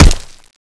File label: kick stone; Zombie Darkness